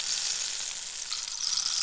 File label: African Rain Stick Pou